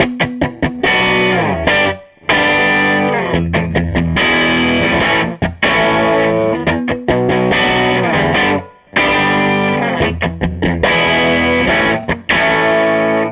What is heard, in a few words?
SG Guitar Loop